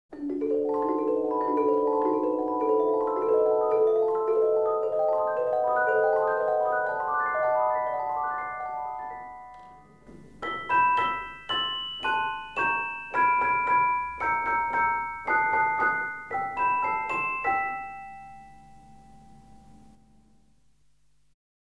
Sonido de celesta; Instrumentos de percusion; Percusion determinada; laminas

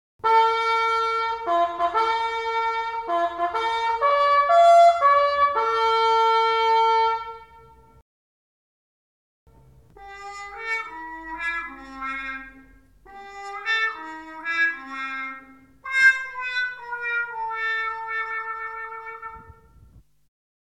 Sonidos de trompeta con sordina; Instrumentos de viento; Viento-metal; Sonoridades especiales

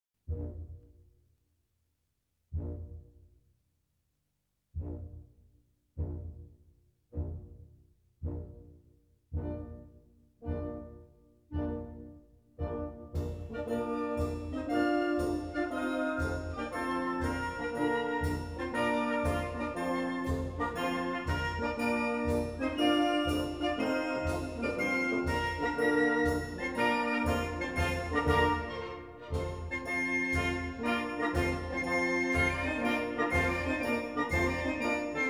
tenor, voces graves

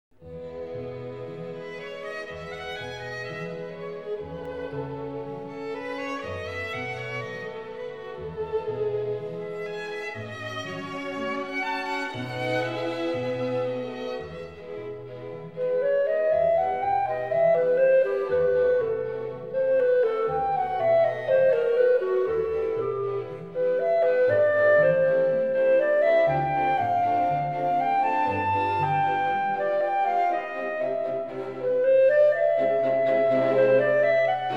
Quinteto para clarinete y cuarteto de cuerda; Agrupaciones de camara; agrupaciones mixtas

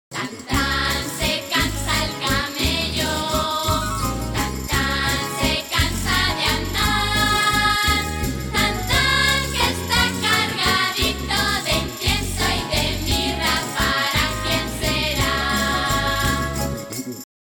estrofa; forma estrofica

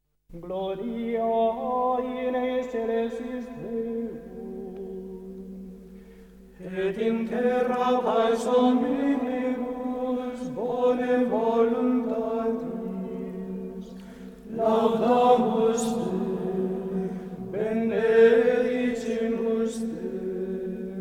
Gloria gregoriano, canto gregoriano, musica vocal primitiva

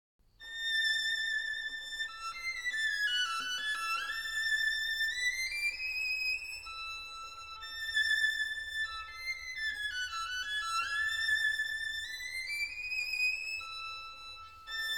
Sonido de violin en legato; Instrumentos de cuerda; Cuerda frotada; Sonoridades especiales

